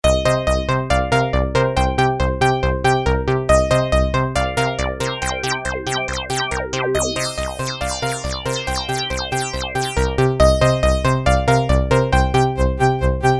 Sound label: synth; Emu XL1 Xtreme Lead; another dead turkey